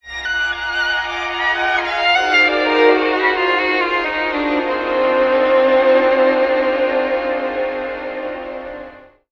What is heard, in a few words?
strings, Sad Loops